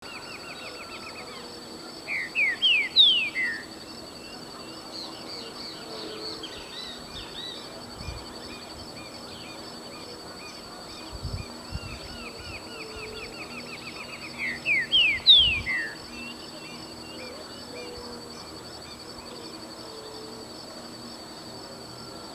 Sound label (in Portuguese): Trinca-ferro - Saltator similis